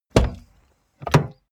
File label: car bmw e46 exterior door front open full; Cars